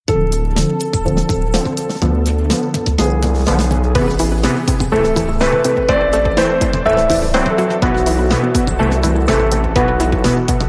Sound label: Groove Prelude in E Min Op 28 No 4